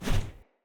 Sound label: Short Sword Melee Whoosh Fill; Enemy; Weapons; Short Sword Elite